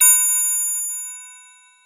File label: triangle